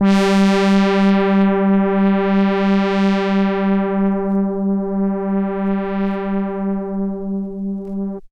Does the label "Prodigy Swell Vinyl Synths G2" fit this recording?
Yes